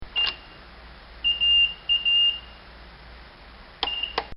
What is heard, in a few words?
Life Care Pump